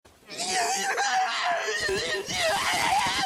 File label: squeal; muscle man